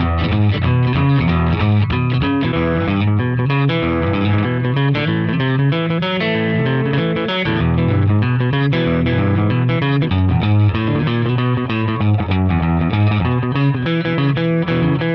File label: Chorus 6 bar progression Boogie E; Loops